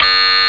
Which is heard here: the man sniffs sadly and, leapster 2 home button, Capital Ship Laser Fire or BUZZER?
BUZZER